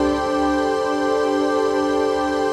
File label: CHRD PAD; Best Service; XX Large Pads; Sample CDs